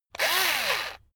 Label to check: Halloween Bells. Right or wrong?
Wrong